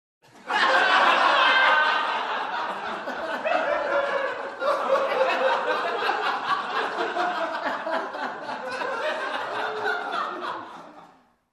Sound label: People in a room laughing